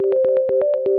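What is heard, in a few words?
122 BPM Arps A major G minor Tonic C; arp essentials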